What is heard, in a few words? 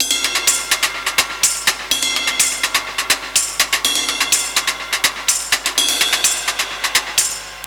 125 Classic Breaks, 125